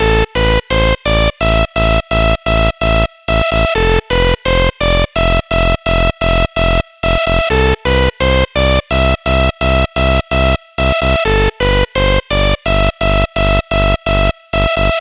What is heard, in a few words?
Melody & Bass